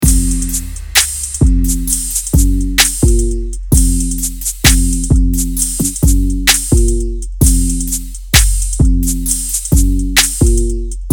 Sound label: trap drums